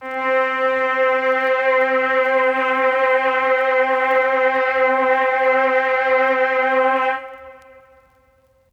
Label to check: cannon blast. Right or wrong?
Wrong